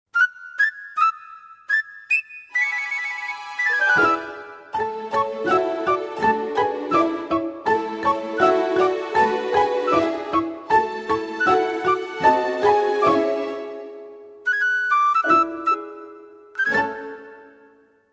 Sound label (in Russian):  (minus)